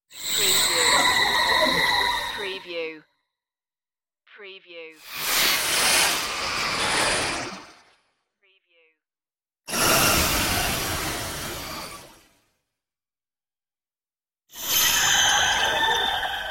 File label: scratchy slide flybys